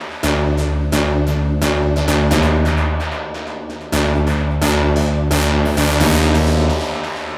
Synth Loop 130 D, Techno; Loops; Synths; GhostHack; Ghosthack - Free Techno Sounds 2024

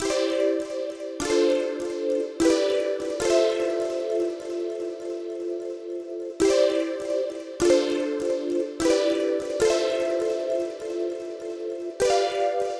Chill Loop - 150 BPM C Min, synths; Chord Loops; Future Bass; Heater

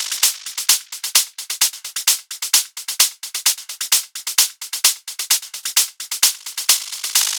Hat Loop 130, Techno

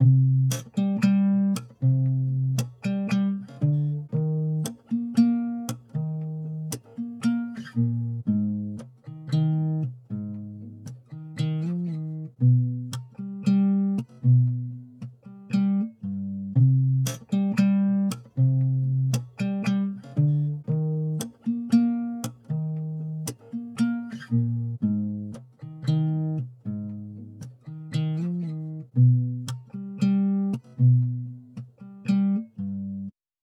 116 BPM C Min Guitar; Loop Stems; Cymatics; Encantadora

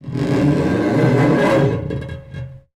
piano FX scrape; Cartoon - film effects

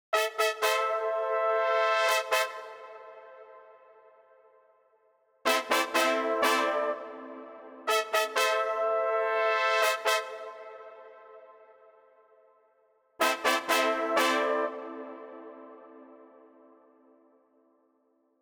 124 BPM A Min Brass; Trap Melody

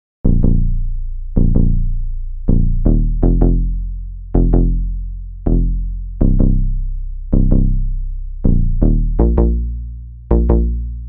161 BPM F Min Bass; Cymatics; Obsession

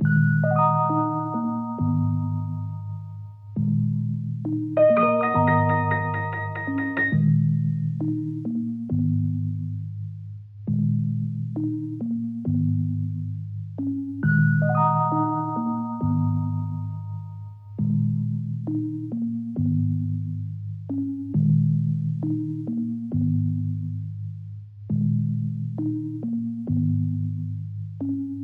135 BPM D# Min Keys; Melodies; Loop Stems; Melody Mystery Expansion; Fake

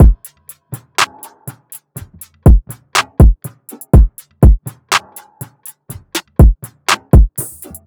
Drum Loop 122 BPM; Connection; Cymatics; Vibes II Drum Loop Collection

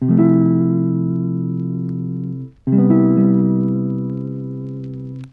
Lofi Melody Loop - 90 BPM C Maj Guitar; Melodics; Loops; Loop Stems; Cymatics; Eternity Sample Pack

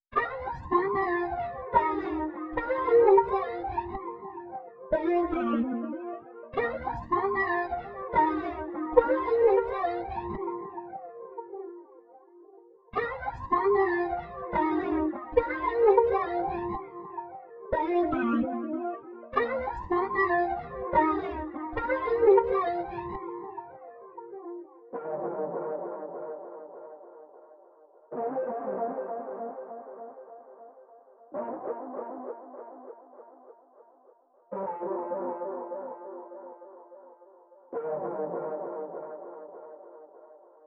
150 BPM C Min Vocal; RnB; Loop Stems; Cymatics; 2022 Melody Collection; RnB Pt 2; Love You So

